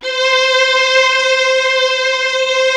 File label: LARG STR C4, Analog Fantasies, KORG; USB Soundscan vol.49; AKAI